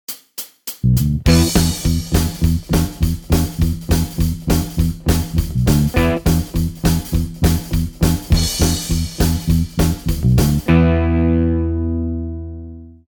Slide backing; Guitar Techniques 2018 Issue 288 November

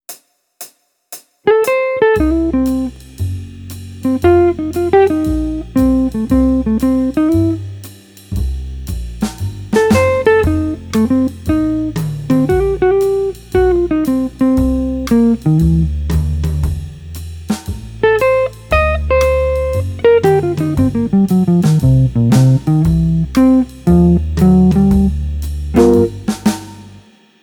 Jazz, Guitar; Guitar Techniques 2018 Issue 289 December

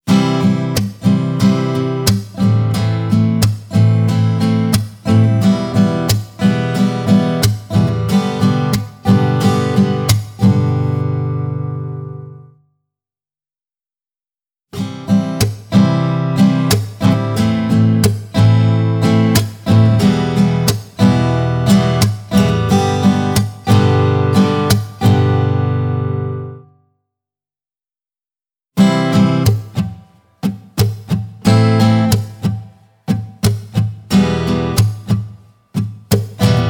Acoustic Strum, Tap, Slap; Guitar Techniques 2018 Issue 289 December

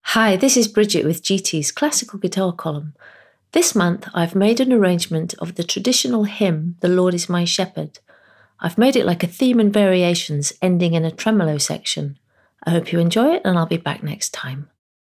Classical intro, Guitar; Guitar Techniques 2019 Issue 296 June

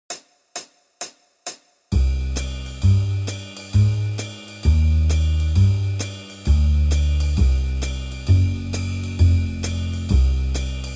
Jazz backing; Guitar Techniques 2018 Issue 289 December

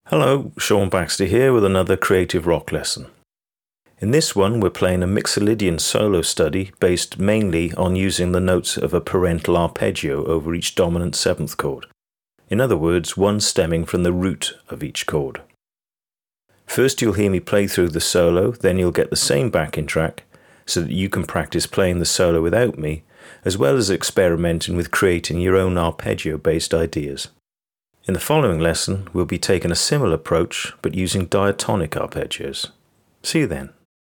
Creative Rock intro; Guitar Techniques 2019 Issue 294 Spring

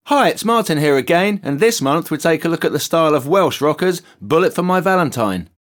Rock intro, Guitar Techniques; Guitar Techniques 2019 Issue 296 June

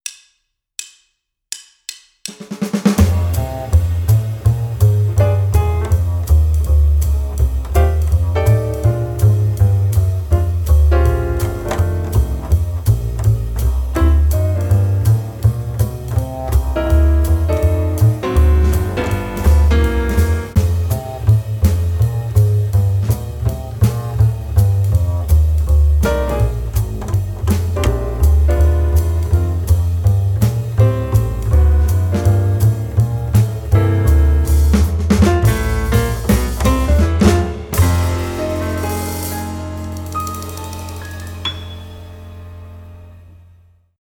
Rhythm Guitar; Guitar Techniques 2018 Issue 289 December

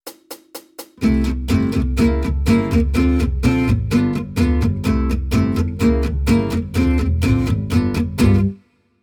Jazz, Guitar

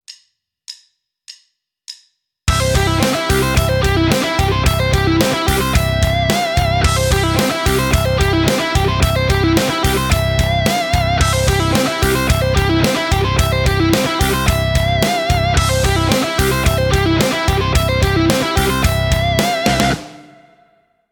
Rockschool, Guitar; Guitar Techniques 2019 Issue 297 July

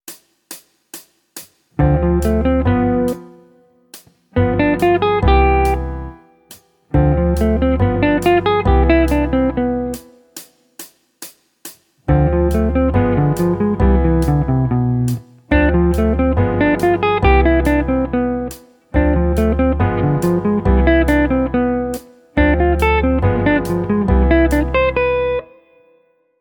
Jazz, Guitar; Guitar Techniques 2019 Issue 294 Spring

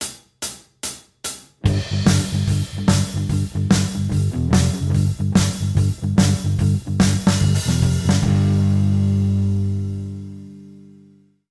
Slide, Guitar; Guitar Techniques 2019 Issue 290 January

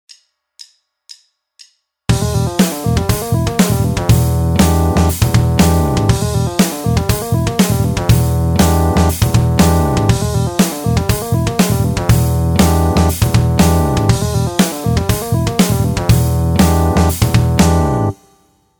backing; Guitar Techniques 2018 Issue 288 November

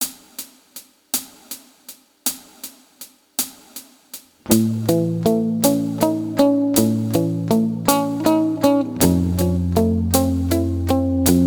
Lickbag, Guitar; Guitar Techniques 2018 Issue 289 December

